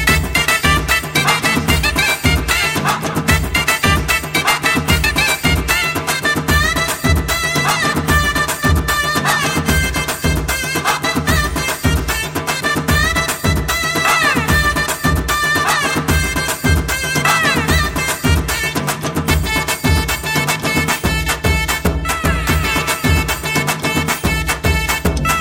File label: Trumpet Tone Telugu